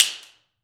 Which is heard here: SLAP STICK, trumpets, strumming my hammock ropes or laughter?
SLAP STICK